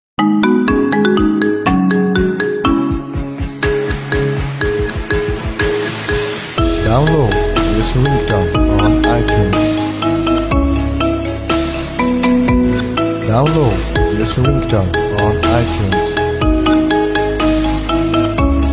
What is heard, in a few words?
marimba